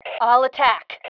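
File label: attack, female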